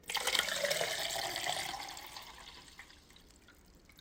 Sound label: REFILL WATER BOTTLE SOUND EFFECT